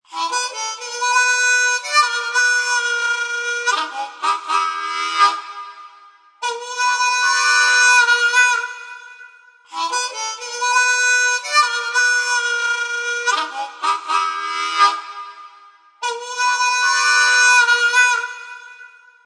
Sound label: Harmonica Riff; Music Ringtone; Harmonica Riff 1 Ringtone; Novelty Ringtone; Musical; Ringtones